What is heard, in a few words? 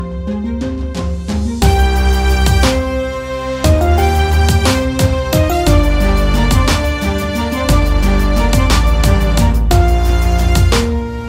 Marimba Remix Ringtone; Game of Thrones